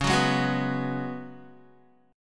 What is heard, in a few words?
C sharp 7th Suspended 4th C sharp, Guitar; C#7sus4; strummed; tuning=4F,4A,5C,5G,5C,6E; guitar chord